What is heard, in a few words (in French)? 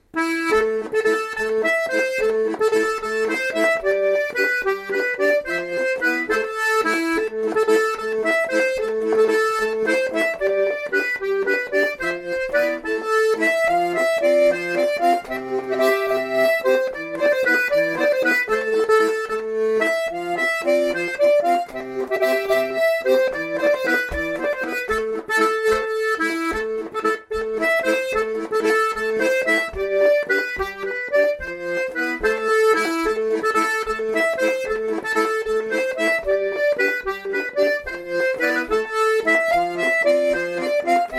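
fandango facile, accordéon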